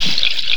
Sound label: under water; Akai MPC2500